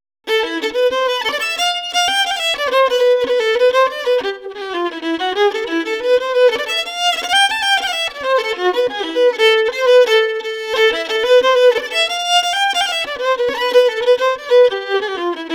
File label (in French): ballet violon